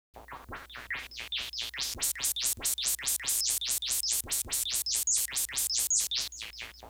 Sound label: squelchy 144